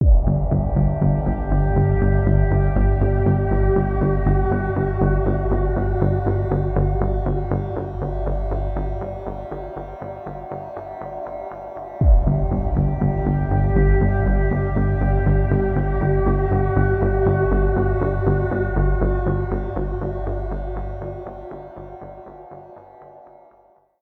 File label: Lose